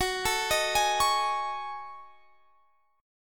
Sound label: F sharp Minor 6th Add 9th, Guitar Standard; arpeggiated; JGuitar